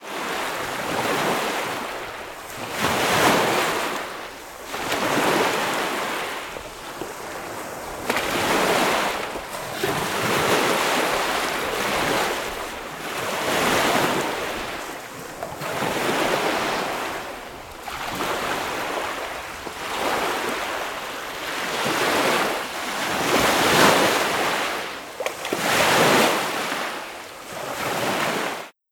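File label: Perito Moreno glacier Waves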